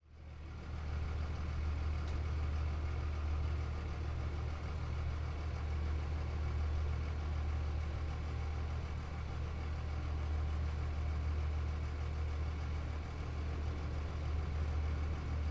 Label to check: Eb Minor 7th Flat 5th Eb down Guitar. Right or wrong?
Wrong